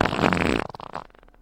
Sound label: fart medium